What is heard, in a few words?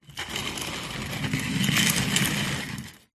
office chair roll on tiled floor one wheel slightly jammed